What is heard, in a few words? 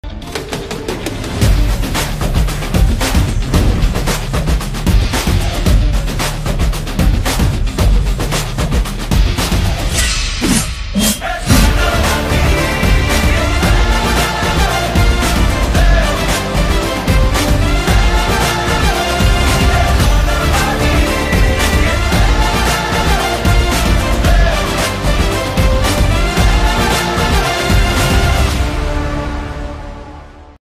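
teaser background music ringtone; jana nayagan; the first roar; vijay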